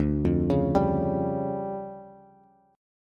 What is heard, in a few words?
D Minor Major 11th, down, Bass, Bass Standard; DmM11; arpeggiated